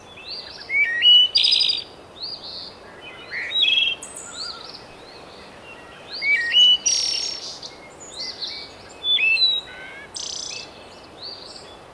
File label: Birds Loop; kult